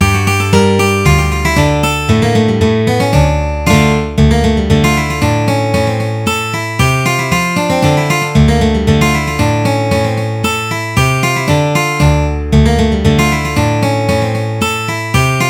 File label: Ukrainian Polka